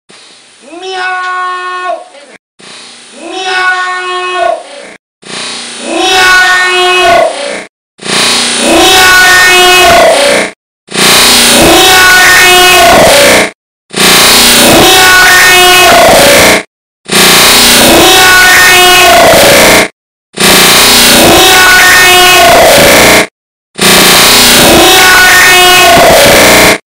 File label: Meow times